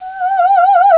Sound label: singer